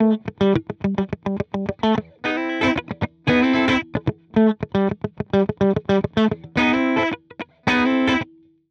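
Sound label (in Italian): reverb no, Esempio di suono pulito, chitarra; riverbero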